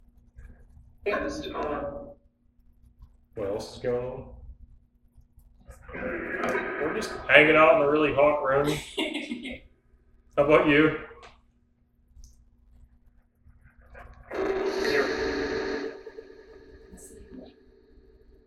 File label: 2nd Floor Dark Wing Phasma Box